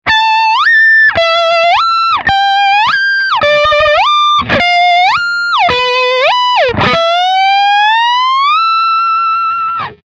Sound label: Whammy Pedal